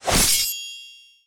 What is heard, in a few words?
sword slash